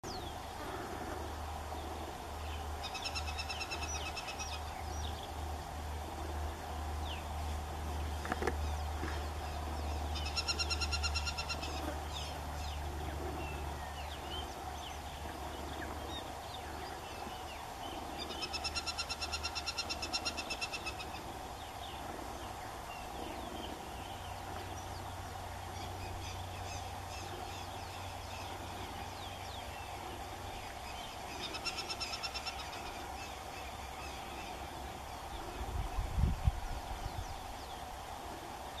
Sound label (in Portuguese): canto do falcão pigmeu africano